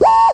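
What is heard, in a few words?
hiphop whistle, Electronic Hits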